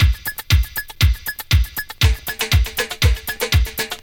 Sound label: 119 bpm old school synthpop drum beat b key; drum loops